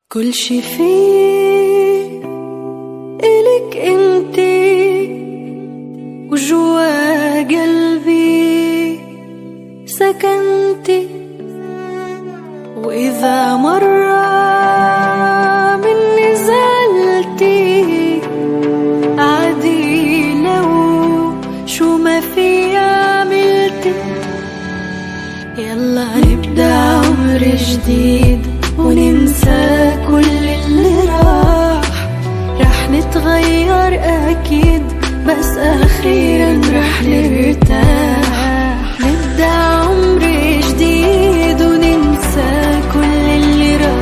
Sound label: arabic remix